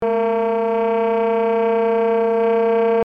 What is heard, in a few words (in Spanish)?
Tremolos